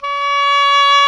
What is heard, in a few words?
OBOE; Roland - LA Composer vol. 1